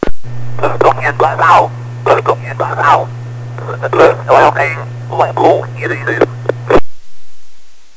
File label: UT-109 LMR Scrambler; Icom UT-109 LMR Voice Scrambler